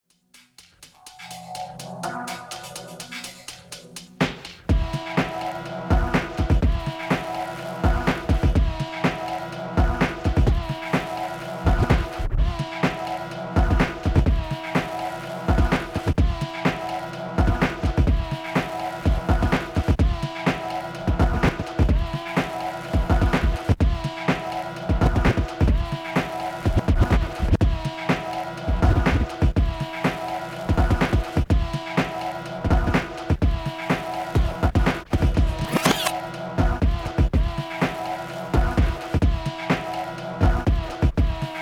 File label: juggling halfspeed bassdrum